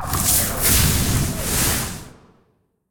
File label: Hero Deal Damage; arcane